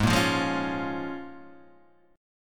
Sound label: C sharp 6th, G sharp, guitar chord